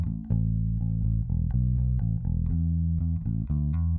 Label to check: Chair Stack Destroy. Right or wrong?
Wrong